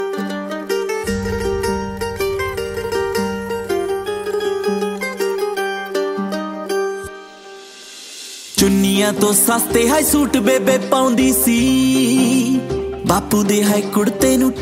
punjabi ringtone

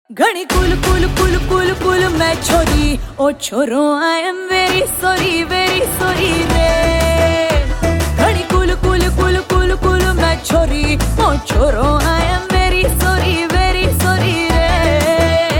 hindi music ringtone

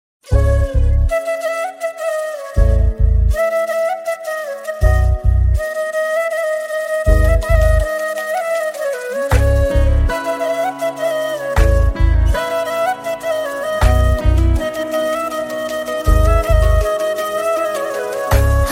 flute music tone ringtone

